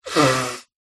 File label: Nose Blow